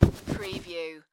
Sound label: Pillow Fluff; sound-effects-bible